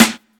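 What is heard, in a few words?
dry acoustic snare sound a key, tuned to the A note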